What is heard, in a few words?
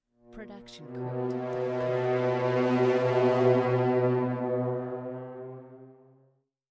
brass low swell